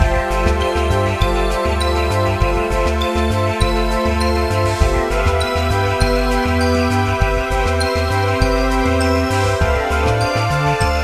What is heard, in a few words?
Bonus; Zenses Ocean; nds gamerip